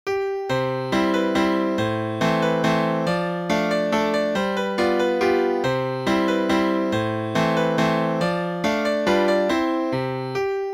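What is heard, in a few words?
Waltz, piano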